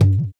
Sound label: EQ Perc, Percussion Mix